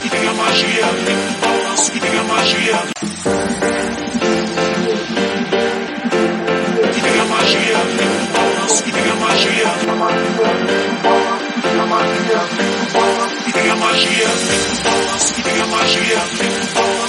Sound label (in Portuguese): Magia Do Samba